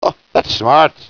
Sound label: pain